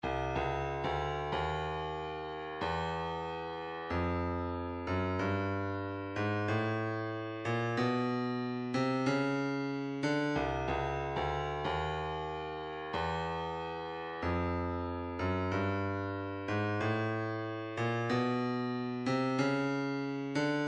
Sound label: 120 kick; Slide